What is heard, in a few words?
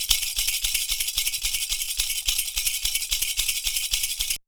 PERCU; Univers Sons; Raricussions